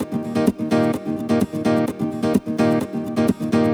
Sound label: Nylon Guitar D# maj, 128BPM; Vengeance Samples; VEH3 Nylon Guitar Kit 2